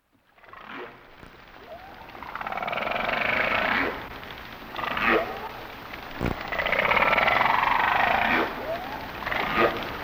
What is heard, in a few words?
Sounds of Nature - Humpback Whales